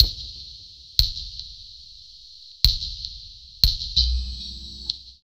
Structured Rhythm, IDM Rhythms

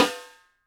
Snare, Roland TD-20

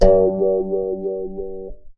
bag finger; Univers Sons - Raricussions

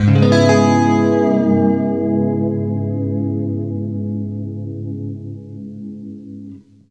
FLESH CHORDS, Guitar

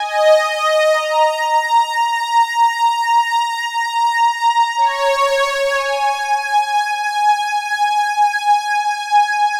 Keyboard

